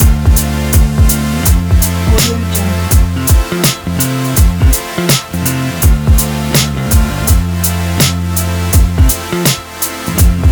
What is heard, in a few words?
new york hip hop